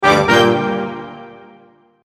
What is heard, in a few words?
a threat